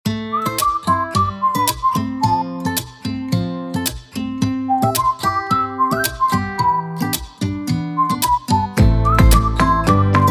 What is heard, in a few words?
whistle vibes